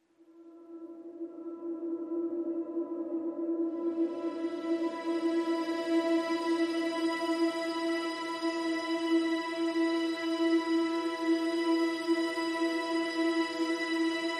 PADS Ghostly Whispers (E)

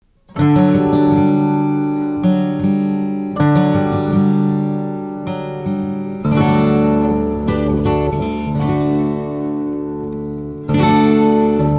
Silent Night, without Jingle Bells

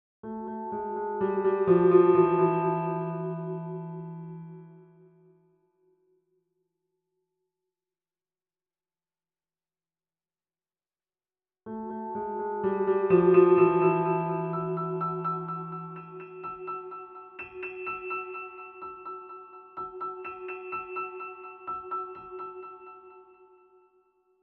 Fur Elise, Piano FX

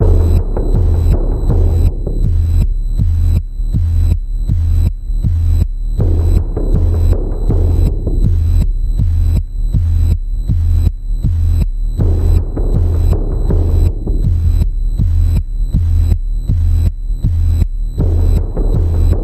Panning Pulse E, Key of E

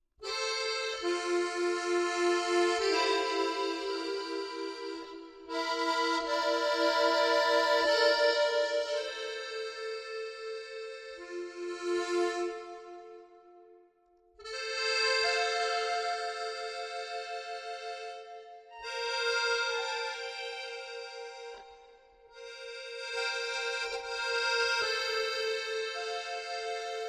No Jodler

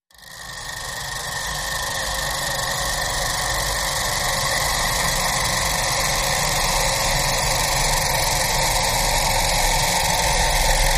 Cycle Sprints Atmosphere

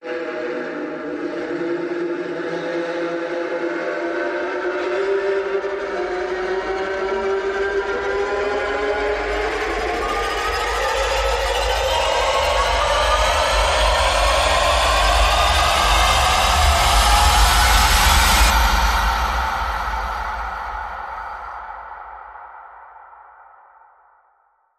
Tense Rise

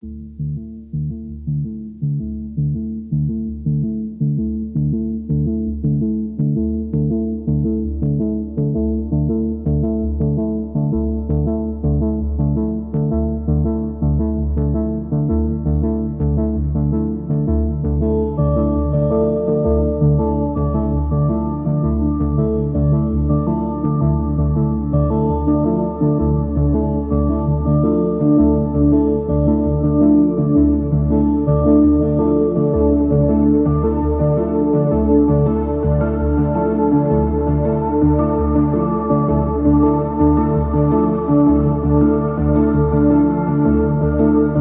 Order, Underscore

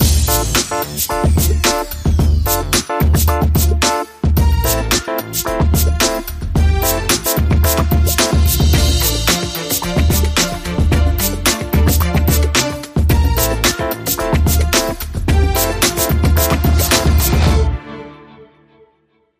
Pop RnB Ramp

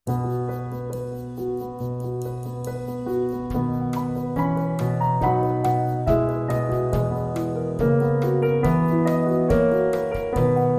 With Trio

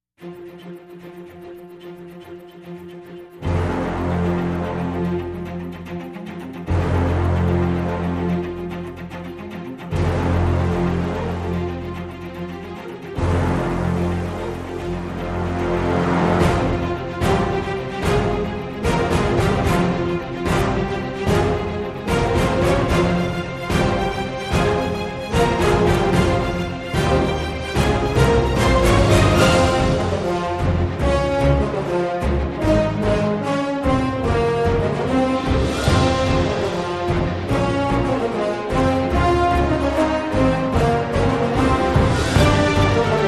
No Choir

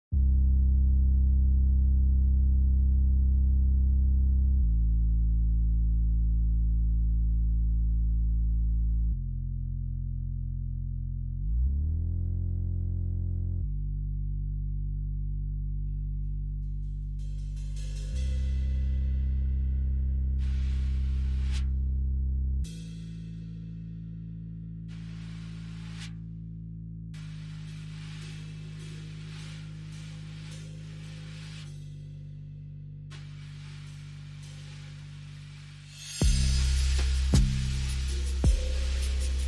Drums and Bass

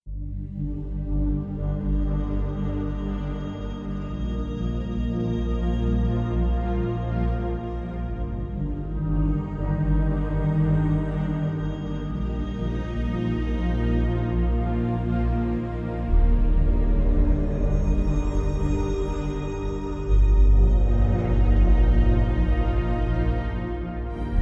Drone

